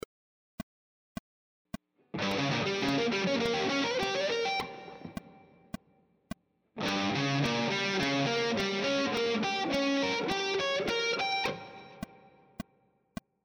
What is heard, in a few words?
triad arpeggio sequences, guitar